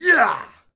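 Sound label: Axeman Attack Vox; Civ4 warlords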